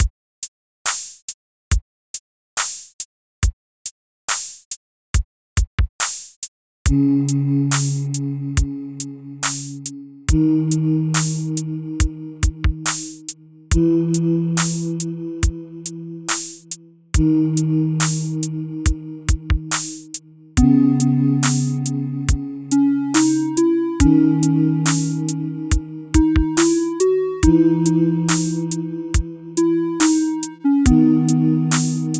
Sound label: Wander in a dungeon, Sipmle track retro-style music